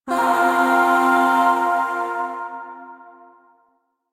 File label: Shawzin Pent Min Chord I; Music; Chords; Warframe; Zariman Void Shawzin; DE